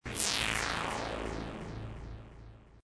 squelchy sine; Dystopia

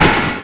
metal damage; Dystopia; physics

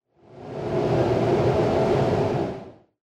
wind; Dystopia; injection

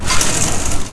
debriz, weapons; Dystopia

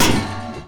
metal barrel soft; Dystopia; physics

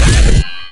program, weapons; Dystopia

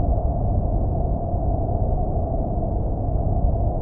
reactor ambient; Dystopia

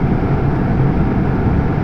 server vent

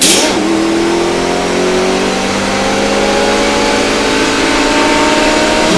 brute nitro, vehicles; Dystopia

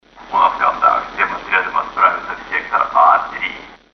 radio; Dystopia; broadcast

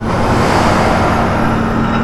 sewers elevator start

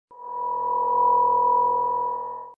signal

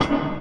elevator stop; Dystopia

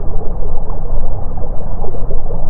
underwater; dystopia

